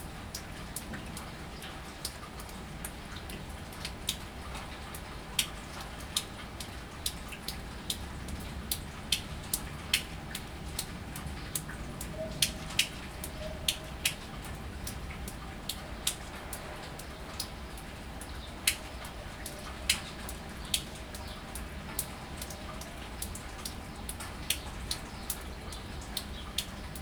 water drops after the storm, recorded at ground level, during a short spring storm, while thunders are rolling in the background.